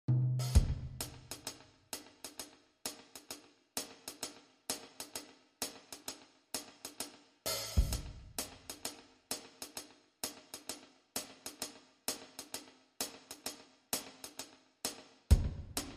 Drums

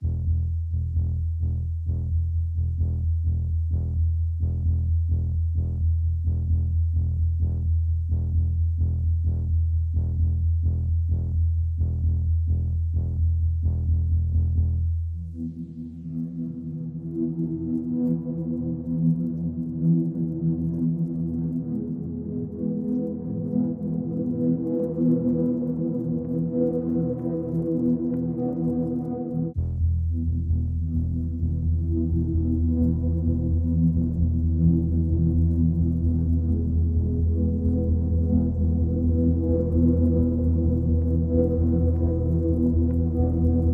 Bass

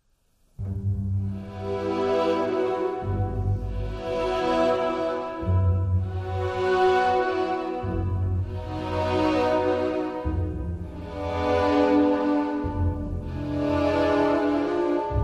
Only Strings

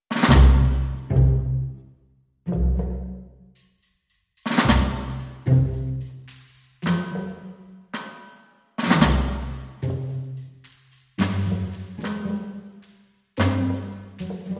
Scary Terror, Careful Suspense No Piano

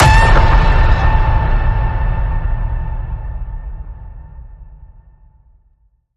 Ping It Loud, A

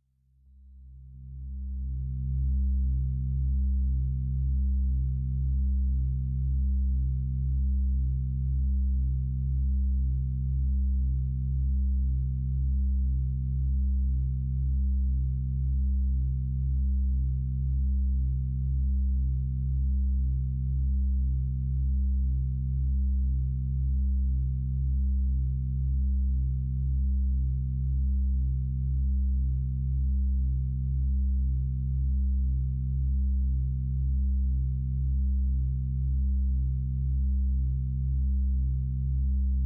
Deep Drone

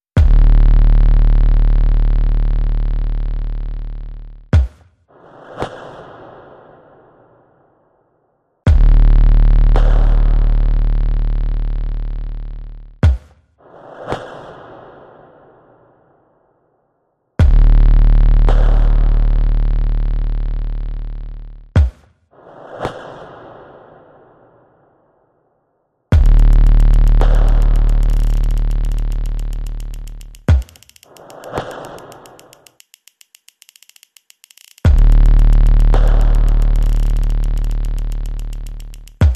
Percussion and Bass Only

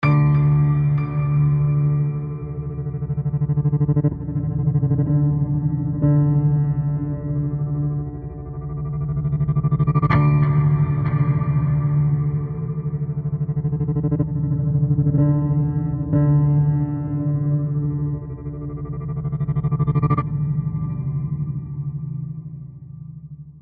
Pensive Pulse

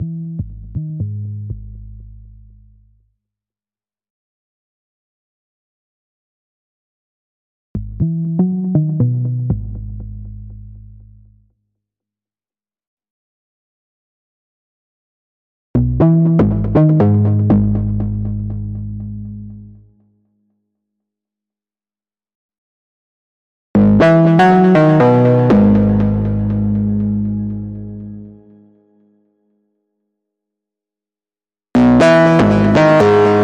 Main Melody No Drums

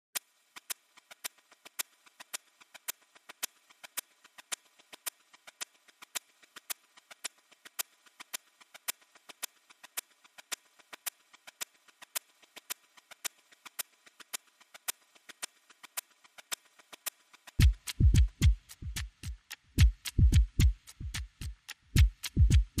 Percussion Only

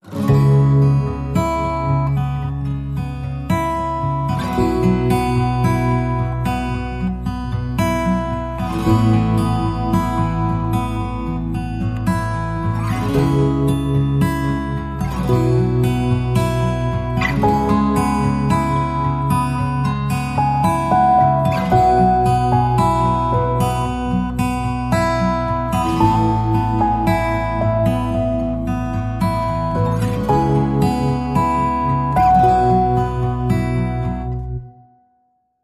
Calm And Serene, No Drums

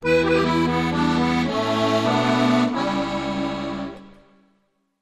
Accordion Bridge

